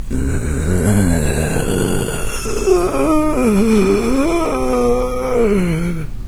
zombie idle; doom3

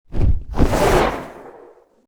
battle wing; macha

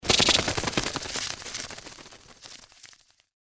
hit sfx; npc; cazadore

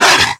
attack hit, dog

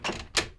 door close

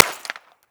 grapple impact

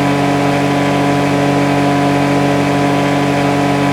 simulated vehicles mid

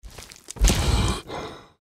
deathclaw idle crouch down; npc

